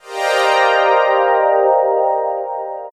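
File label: C minor, Drum & Bass Pads; Total Drum&Bass; 90 s Sample CDs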